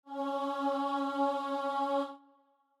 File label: starting note, voice; tranquillamente in a major